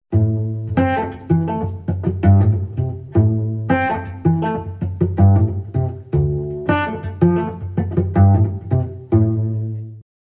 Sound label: Cello Suite 3 Pizzicato